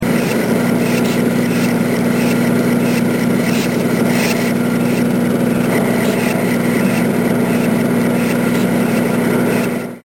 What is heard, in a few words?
Machine Hum